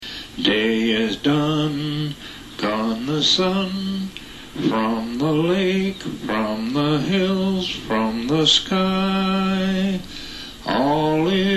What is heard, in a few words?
Day is Done (Taps); campsongs